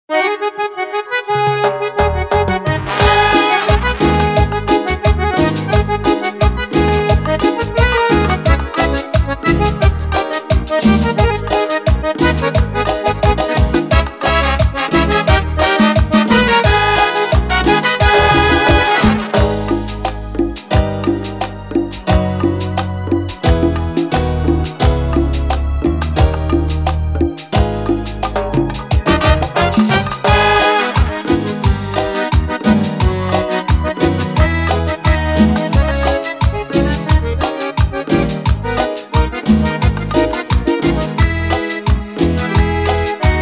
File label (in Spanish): Versión cumbia